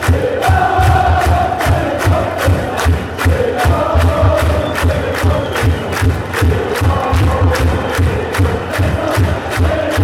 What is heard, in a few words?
chants; stand up